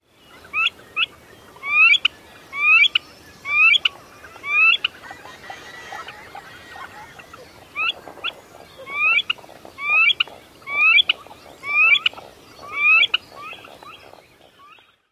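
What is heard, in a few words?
ooo-eek calls, Eared Grebe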